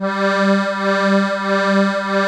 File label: STEIRI G 2, STEIRISCHE